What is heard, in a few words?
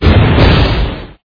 rock golem attack mid low